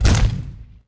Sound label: pot hit stone lg; objects; Star Wars Obi-Wan; XBOX